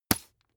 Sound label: bath duck hit hard